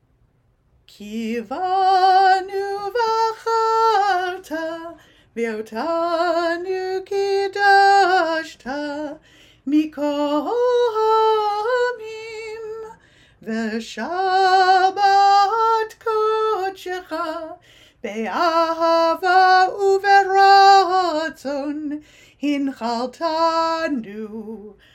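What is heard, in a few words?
Kiddush Ki Vanu Vacharta, Group Songs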